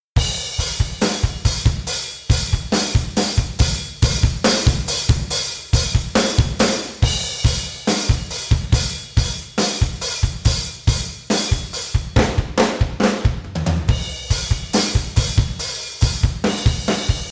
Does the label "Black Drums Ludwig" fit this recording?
Yes